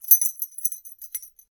clink my keys, 鍵をチャラチャラ

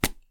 remove pen cap, ペンキャップ外す

